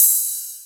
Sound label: Cymbals Ride; Vengeance Samples